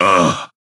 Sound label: hurt